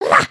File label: headbang